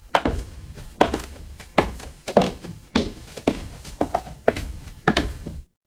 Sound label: footsteps stairs